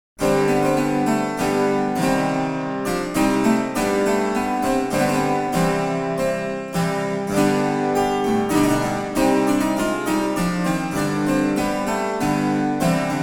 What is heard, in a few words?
German Harpsichord 1741 - Tutti; Excerpt from My Ladye Nevels Grownde by William Byrd; edition organeum